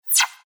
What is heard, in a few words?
Swish Digital Sweep High Pitch Short Scifi Interface; Whoosh; Sound Design; Tom Hutchings